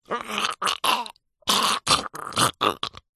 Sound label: human male strangle choke die vocal; zapsplat